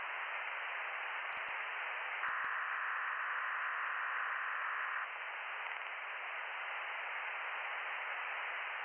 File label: from radio, 7177KHz